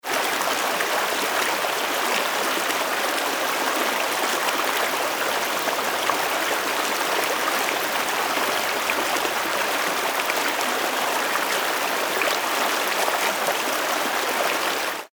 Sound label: stream small